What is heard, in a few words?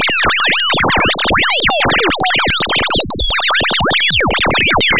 fast chirp